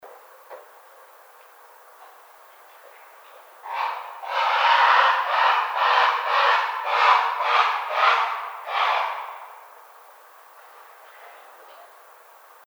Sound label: Great Blue Heron